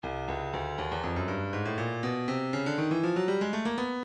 breakbeat midi, 120 bpm; Dunce Party; Stylus Breakbeat Midi Files

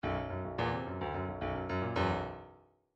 bdf midi file grooves funk n funk n

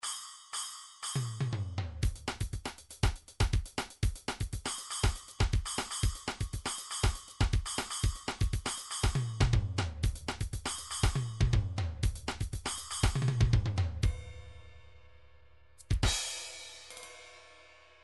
midi drums bossa

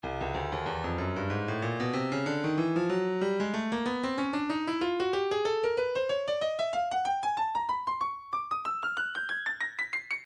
breakbeat 094 bpm; head knod c; Stylus Breakbeat Midi Files

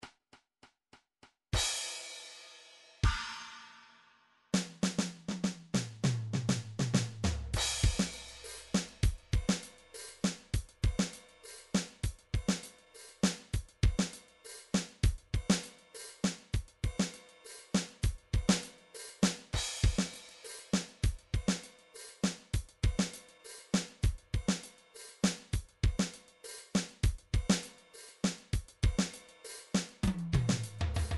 drum trax odd 200

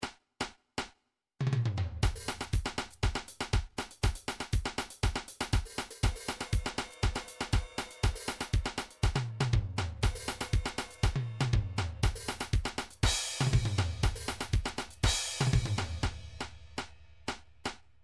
midi drum patterns drums beguine

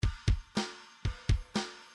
bdf midi file grooves rock b rock b

